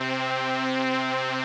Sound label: 505, String Machines